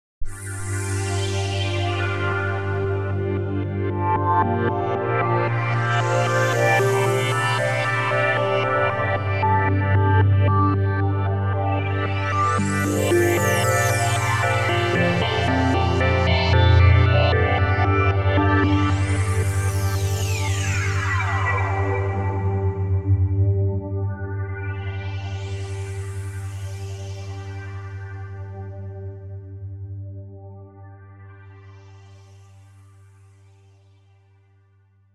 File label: LC Cell Pad